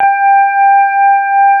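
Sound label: CX3 organ G5 R, Keyboards Old School; USB Soundscan vol.09 - Keyboards Old School; AKAI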